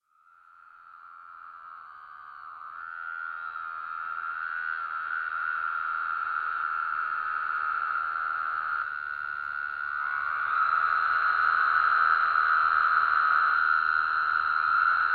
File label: woodhouse toads